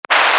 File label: alarm phone